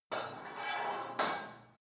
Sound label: put weight onto barbell reverby quick, fitnessstudio